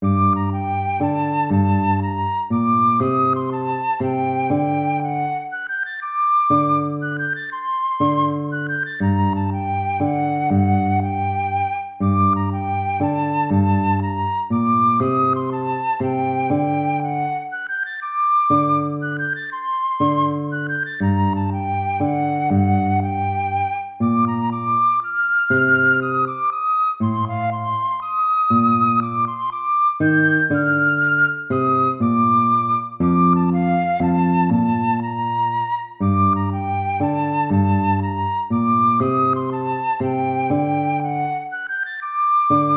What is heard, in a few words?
baroque minuet minor